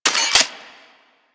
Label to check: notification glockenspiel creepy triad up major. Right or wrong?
Wrong